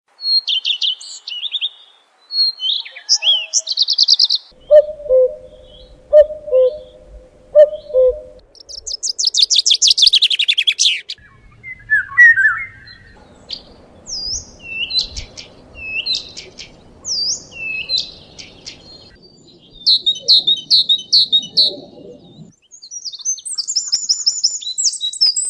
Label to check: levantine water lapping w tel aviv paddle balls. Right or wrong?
Wrong